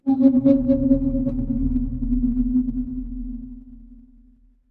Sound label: magic scifi alien